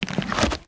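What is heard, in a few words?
ui open backpack container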